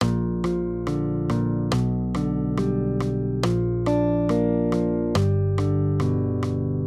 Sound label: maj triads; accompaniment